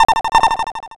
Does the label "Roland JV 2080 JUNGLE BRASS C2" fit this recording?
No